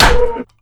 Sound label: axe hit world; weapons; tf2